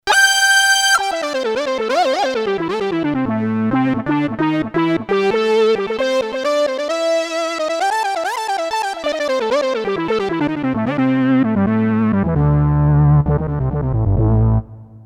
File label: SYNTH mono legato solo lead, Roland JD800